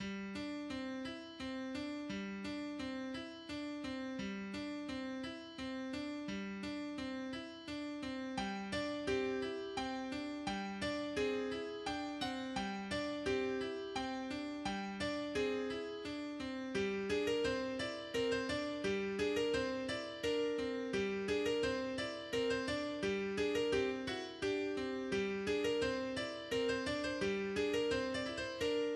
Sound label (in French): Hanter dro